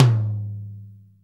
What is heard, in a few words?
hi tom sample g sharp key